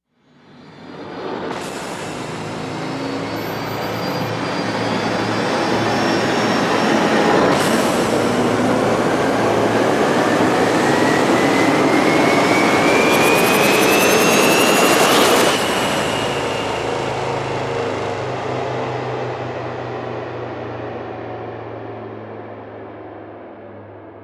Power Down Rise; Ninja Tracks

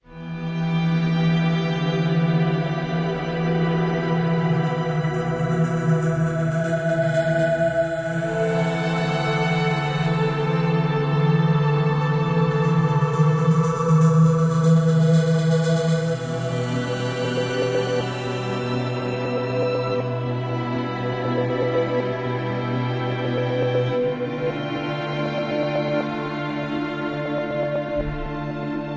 Farewell Ambient

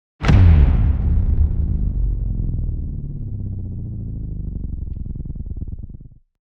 Hit Downer; Ninja Tracks

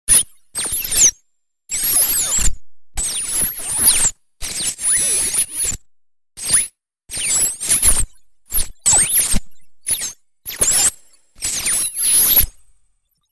Storms Swarm; Gothic Storm Music; Advanced Sound Design 2012

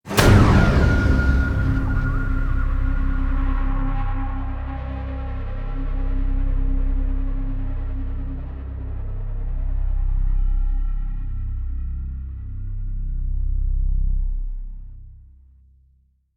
Hit Title Outer Rim (C); Brand X Music; Brand X Music Catalogue - Editor's Essentials

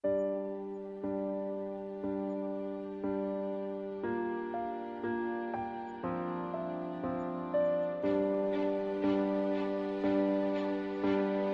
Natural Ambient

